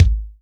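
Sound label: BD, bass drum; Best Service Gigapack 2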